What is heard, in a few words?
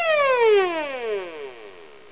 emergency